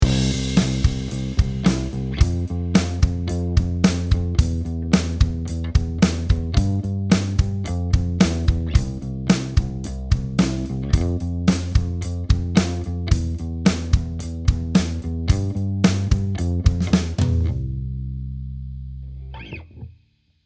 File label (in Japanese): ピッキング, bass